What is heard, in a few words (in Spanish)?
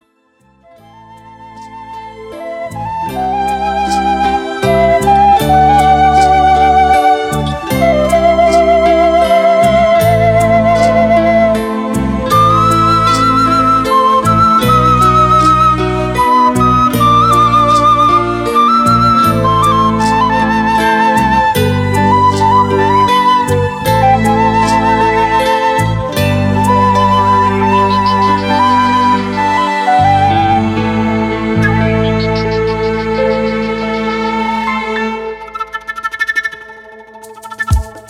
ROMANCE DE VIENTO Y QUENA